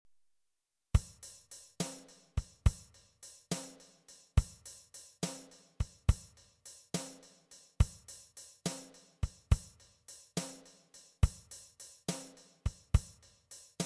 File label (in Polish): slow shuffle tempo 70, gitara